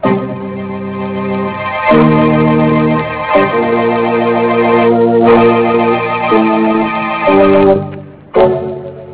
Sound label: creepy music